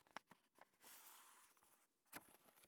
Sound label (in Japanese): 本をめくる